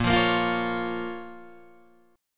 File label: A sharp Minor A sharp down Guitar DADGAD